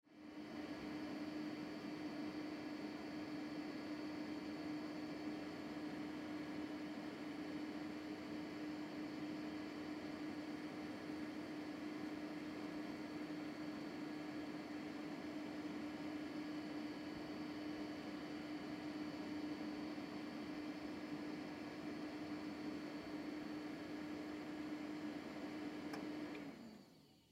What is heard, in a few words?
Apple Off Shutdown, Off Shutdown Turn Off Computer; Computers; Electronics; Technology; Blastwave